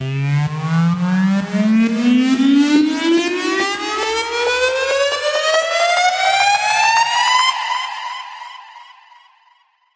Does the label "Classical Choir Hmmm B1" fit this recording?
No